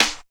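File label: SD -R, snare drum, Drum & Bass; Ueberschall; Drum & Bass Resonance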